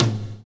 TOM FILL

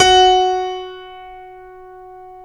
StereoGrand, Keyboards

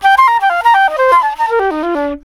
flute, D brass licks

